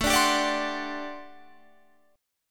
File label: G Major 7th Suspended 2nd Suspended 4th A